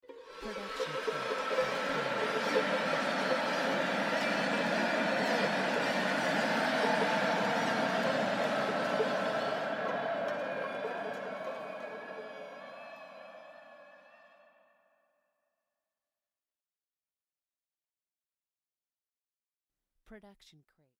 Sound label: strings noise